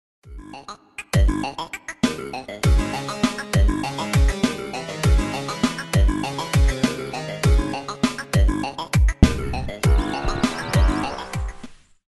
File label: Halloween Theme